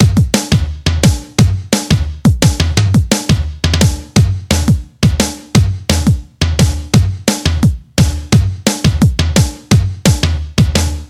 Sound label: Jazzstep DnB